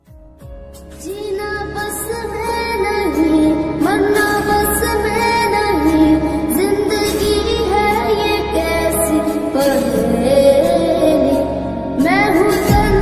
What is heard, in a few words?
Odia Song Ringtone